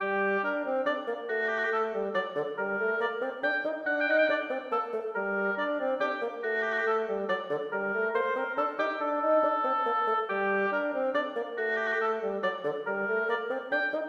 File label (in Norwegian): Menuet for obo og fagott; Aamodt